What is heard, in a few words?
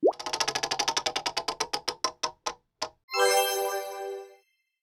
Spin Wheel; ToothyV; GUIPackCartoon